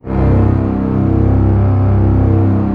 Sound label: ORC C0 PP, Orchestra; Sound On Sound - Peter Siedleczek's Orchestra